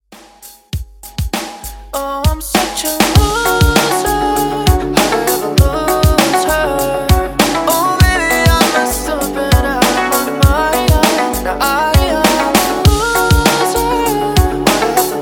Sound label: Top40s Alternative Mashup, 99 BPM